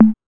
Techno H; EMU XL-7

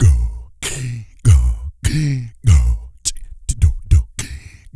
big breath; Spectrasonics; Vocal Planet; Blues Soul

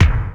TURBO KICK

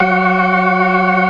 organ, all stops; Keith Emerson Signature Series

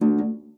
STOP C#2, harp; Xsample VOL-8; HARP+FX

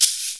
shaker; Univers Sons; Basicussions

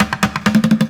Street Groove, Ambient Grooves

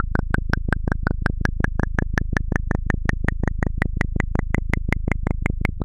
Noise, LFO & Noise

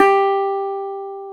GTR, Dobros, National; Club-50 - Foundations

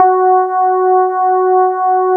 DX PIANO; East West; Twisted Textures-BT

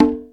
Cng OTOFF, dry perc; Quasimidi Rave-o-lution 309

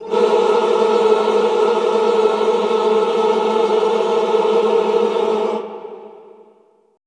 classical choir, cluster; Best Service; Extended Classical Choir

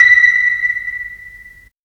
hunt, stabs & hits

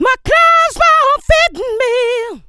VOX, Funky Vocals; USB Soundscan vol.18

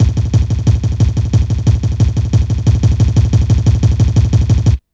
Kick Loop, Drum & Bass

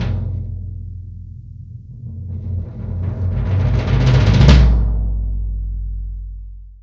GONG BASS

